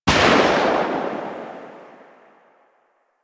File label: Uzi Pistol Tail, weapons; Grn Uzi Pistol